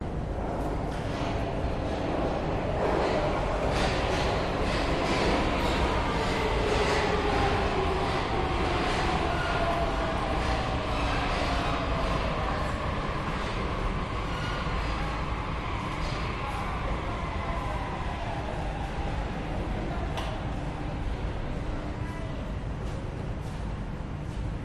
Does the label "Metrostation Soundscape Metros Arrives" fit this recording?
Yes